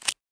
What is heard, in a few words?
Box Close; Dynamic